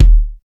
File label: tight bass drum single hit D# key; kick